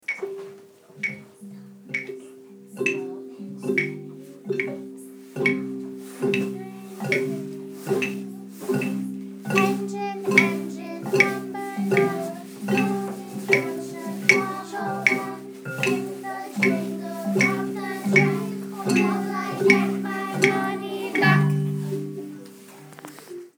engine engine, 1st grade students used rotating stations to play a ste; engine, engine #9; bass xylophones